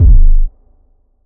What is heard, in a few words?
small reverb steel kick drum sample g sharp key